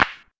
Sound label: PERC